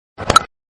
cricket bat hard hitting sound notification tone

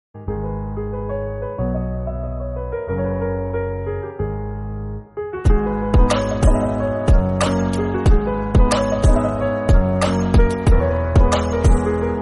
piano instrumental bgm

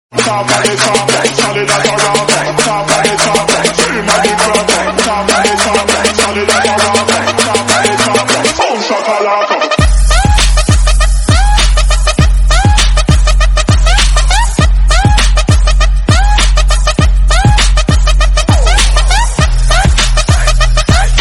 villain entry, bgm; petta

